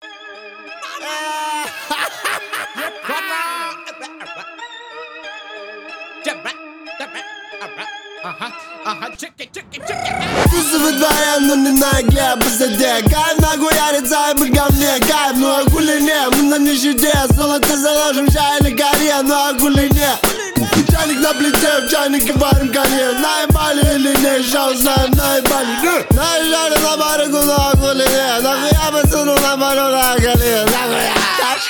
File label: old school beat